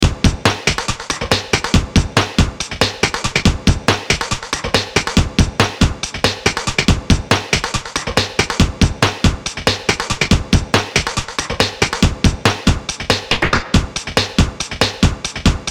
Space Break

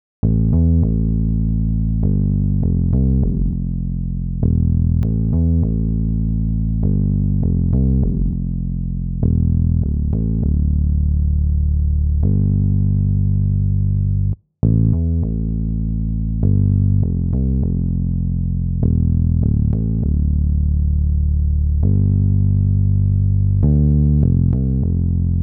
Bass

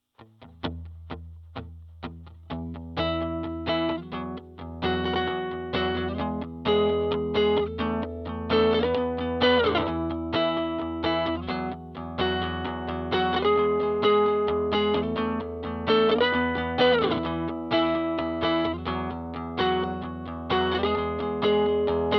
E min Gtr

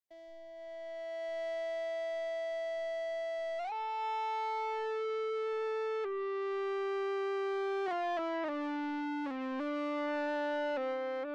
Ebow Ensemble; Mille Regretz

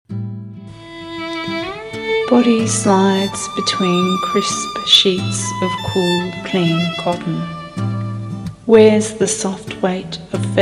Night Meditation Groove

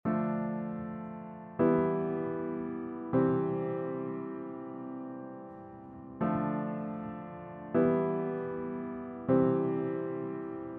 78bpm Piano Samples

